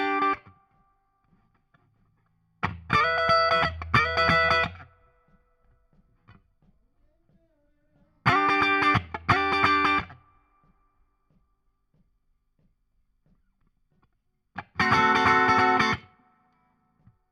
Guit Solo Back

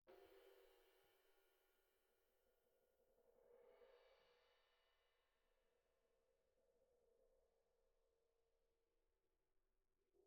Snare Bot

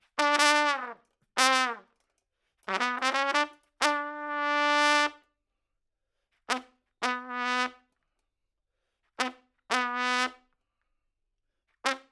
Trump

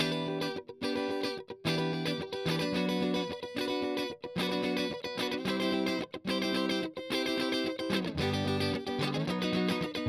Strum

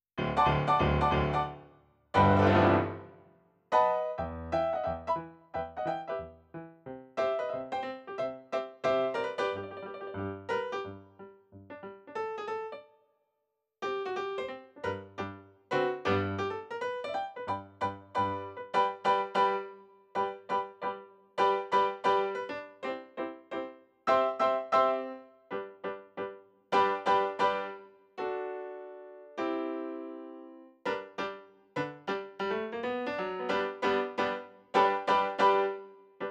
RnR Piano

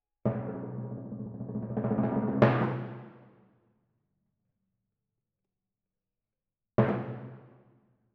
Twin F Timp

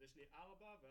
Room A

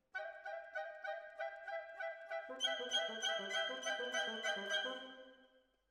Violins, jazz for piano

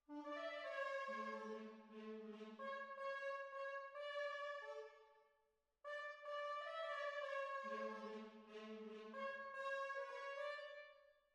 Hall

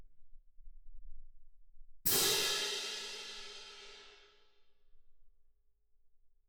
Twin R Perc

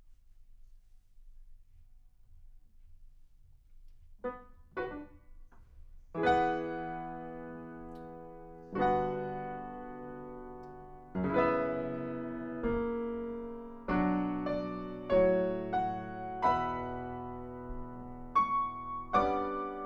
Piano Room

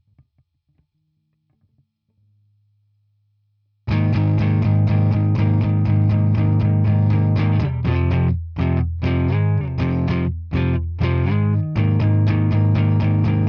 Guitar

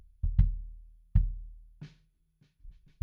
BD In

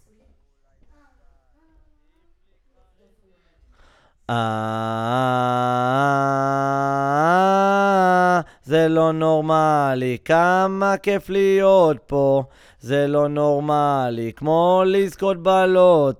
VOC GUIDE

